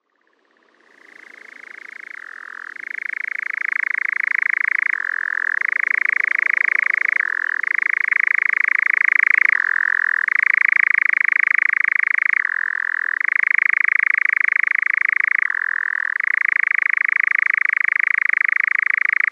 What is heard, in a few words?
nightjar caprimulgus europaeus